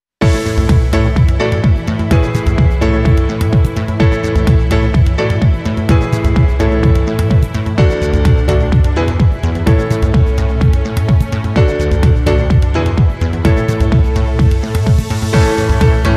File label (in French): GENERIQUE PIANO